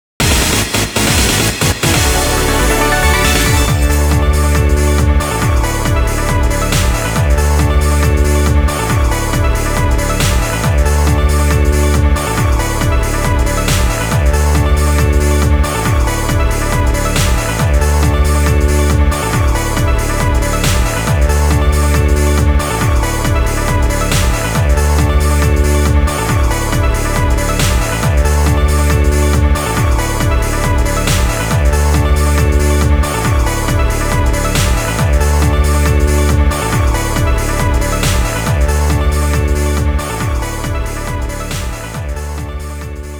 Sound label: Level UP Jingle; Busou Shinki Battle Masters; PSP